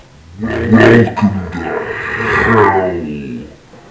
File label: dragon